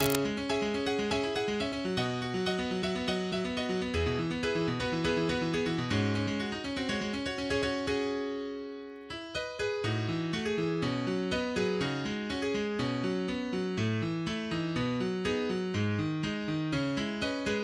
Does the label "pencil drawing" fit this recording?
No